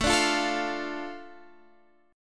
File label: D Minor, A